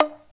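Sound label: pad hit, flute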